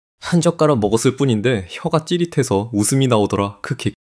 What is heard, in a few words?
laughter